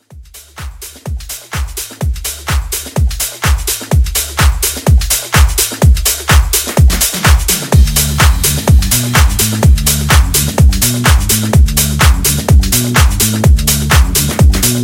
Club House Edit 126

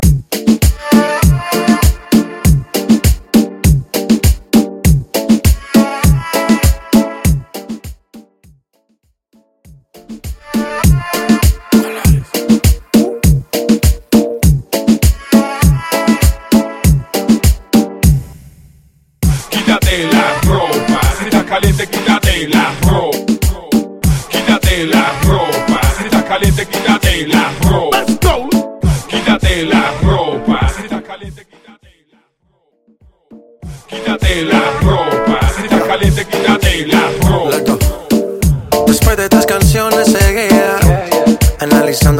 Reggeatton; Mainstream VIP; 100 Bpm

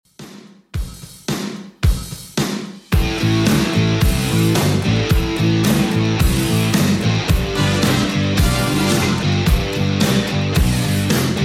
80s Rock Redrum 110bpm

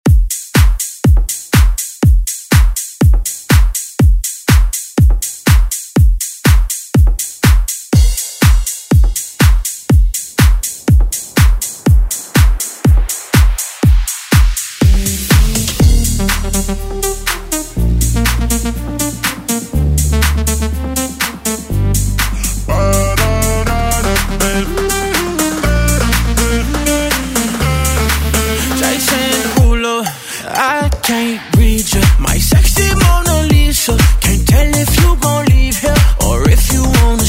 Pop Redrum; Deejay Hit

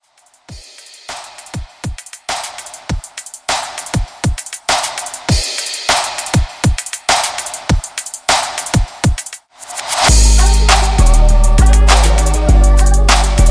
Hip Hop Rework; Remixfreakz

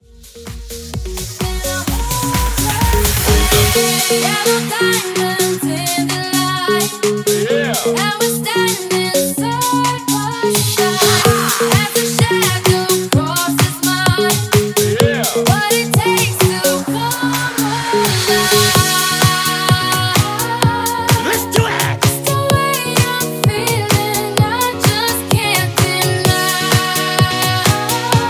Pop House 128bpm

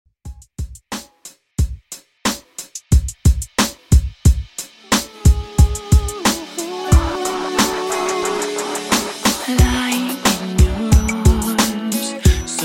Extended Mix 90Bpm

